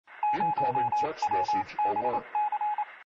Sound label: morse code